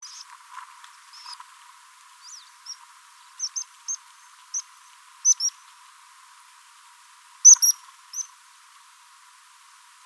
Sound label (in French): chevalier guignette